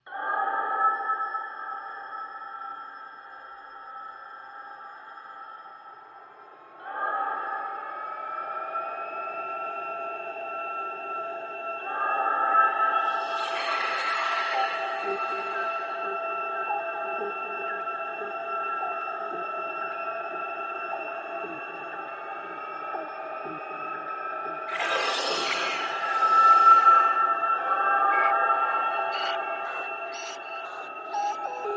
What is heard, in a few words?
Galactic Radio Waves Co, communications